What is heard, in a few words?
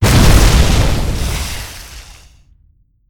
Flame Strike Burst; war3source